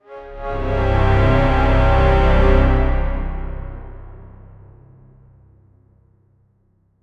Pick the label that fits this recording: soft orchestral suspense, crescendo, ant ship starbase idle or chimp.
soft orchestral suspense